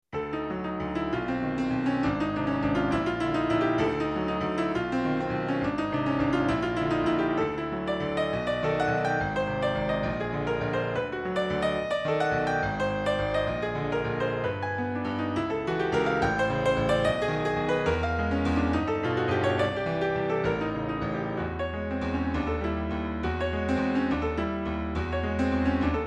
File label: Wake Up Piano